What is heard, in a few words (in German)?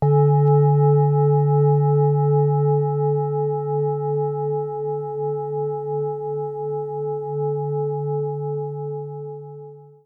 Klangschale; Mars Uranus